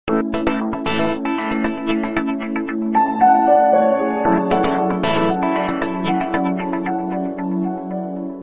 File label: Power On